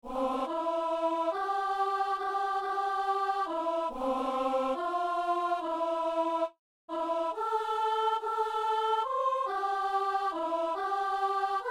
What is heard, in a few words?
alto; Messe du 17 janvier à Saint-Denis